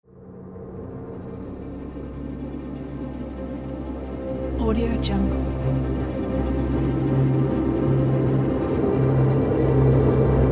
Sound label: dark ambient